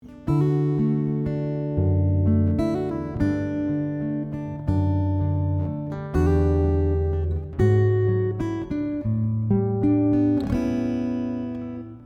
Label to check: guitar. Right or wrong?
Right